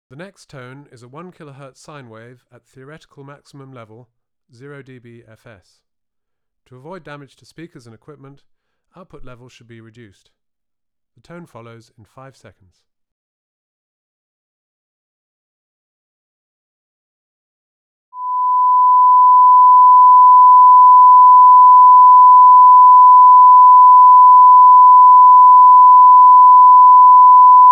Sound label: Max Level Tone, 1 kHz sine wave at 0dB FS